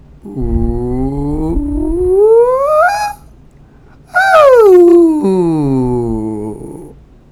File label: glides